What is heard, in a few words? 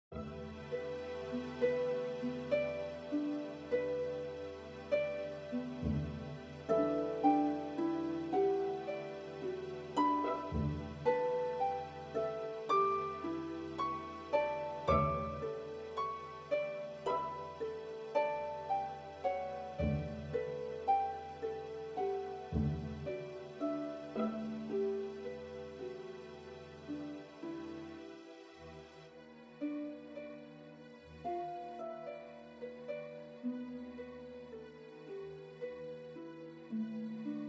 Concerto for Harp and Strings